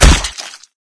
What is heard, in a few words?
body medium impact hard; source engine; tf; physics